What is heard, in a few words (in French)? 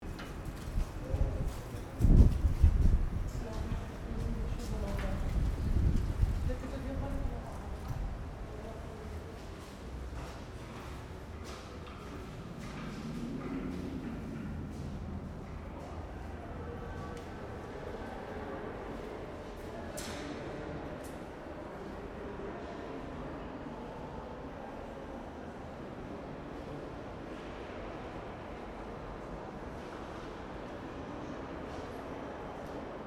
Souterrains Louvres parcours carrousel Sully